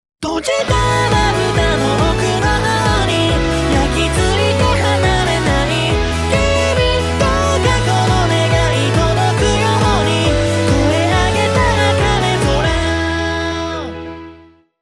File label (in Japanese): 男性楽曲サンプル